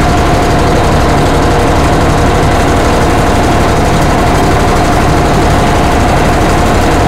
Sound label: tigerdiesel engine int rpm load, engines tanks; War Thunder